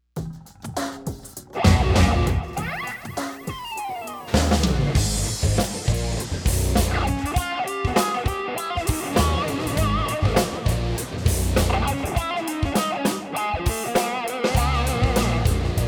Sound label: ME-50